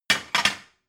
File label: plate shelve slide hard